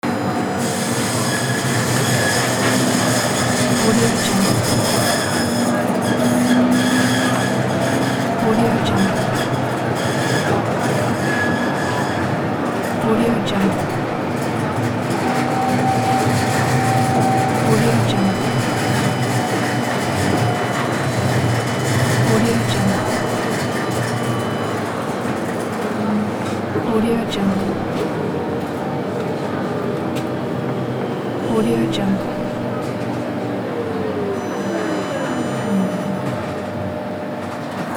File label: tram stopping interior ambience